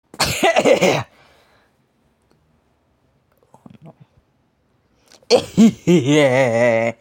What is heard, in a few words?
jumpscare sound lol; five nights at putos